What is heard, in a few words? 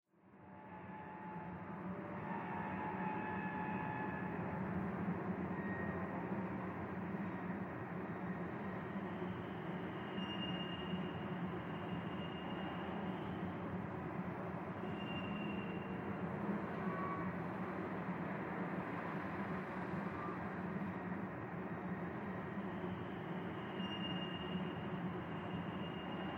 nightmare cello; Syupro-DX; Android